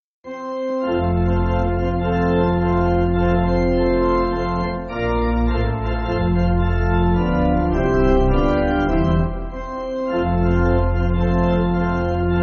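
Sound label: Pipe, Pipe Organ, Music Backing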